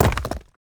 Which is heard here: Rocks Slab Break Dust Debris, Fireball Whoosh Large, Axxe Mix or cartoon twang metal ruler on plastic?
Rocks Slab Break Dust Debris